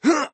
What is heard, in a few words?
hurt male; nickelodeon basketball stars 2015